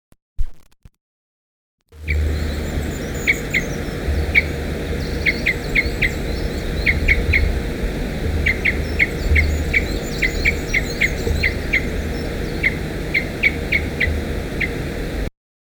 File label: type C, Parrot Crossbills; Scottish Crossbill